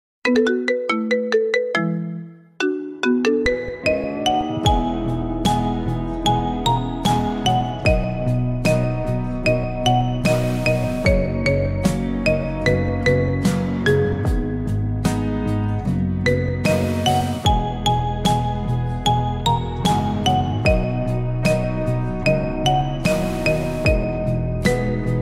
Marimba

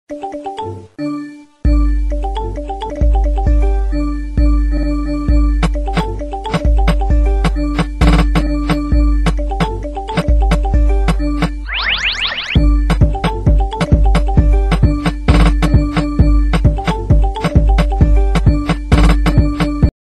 Notification Sound SMS

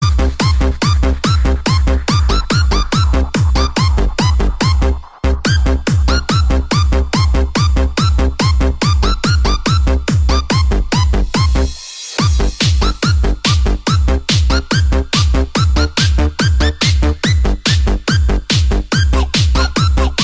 Merry Christmas Tea

